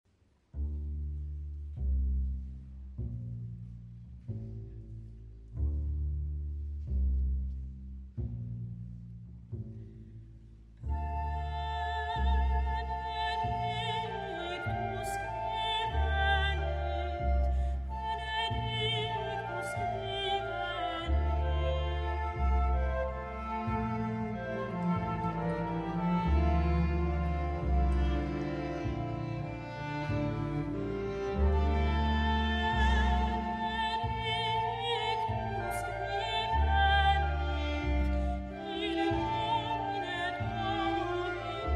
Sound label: hled male jezulatko; 6. Benedictus